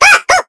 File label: Honeying Happy